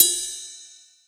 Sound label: Real Open, Hats